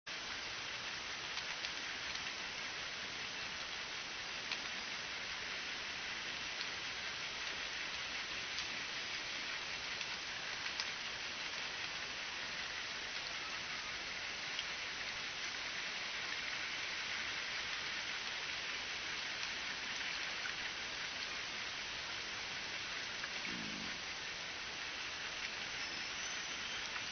one faint whistle, orcasound

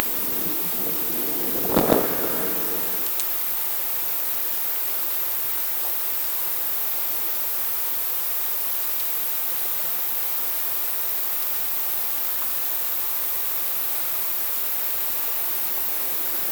Clicks Calls

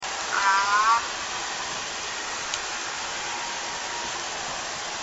S4 rising, orca call

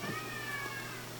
down call, KW; Biggs

